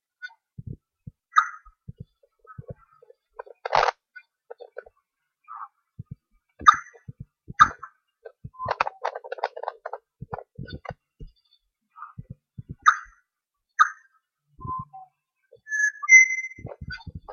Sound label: birds; palmerston